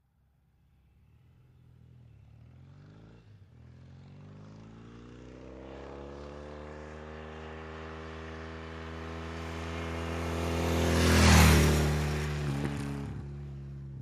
Motorcycle, Norton, Accelerates In And Fast By ( 45 Mph ), Back Off Throttle On By.